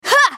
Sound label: effort; Nami; Character Voices - English